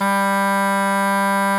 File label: kortholt G 2; SoundBytes - Early Patches